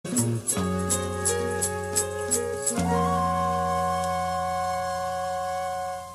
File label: Ringtone